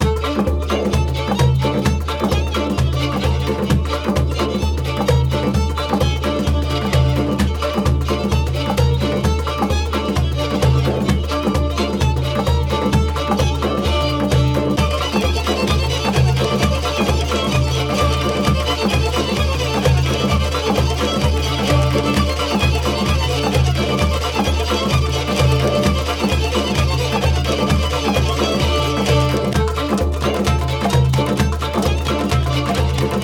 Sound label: Violinsanity; RuneScape